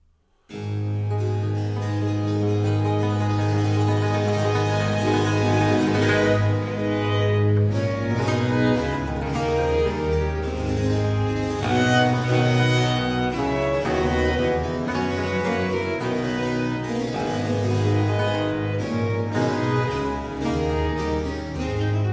instrumental sonata in a minor; Early-music ensembles TENET Vocal Artists and ACRONYM

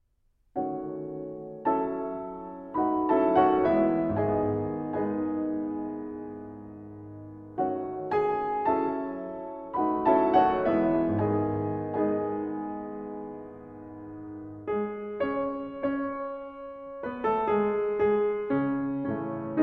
pour le piano sarabande

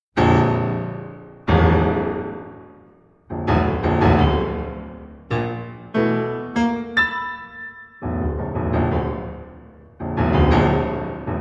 piano sonata allegro con fuoco; malcontento

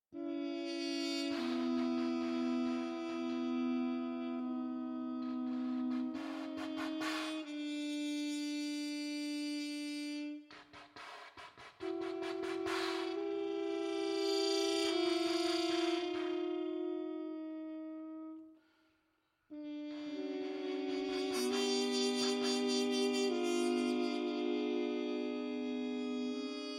fanfanfaren largo, horn; Andy Kozar; works for multiple trumpets

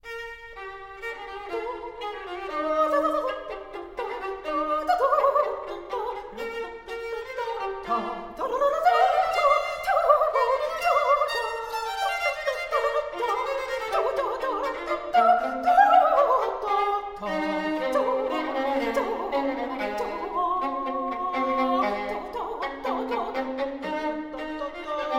duos for 2 violins serbian dance

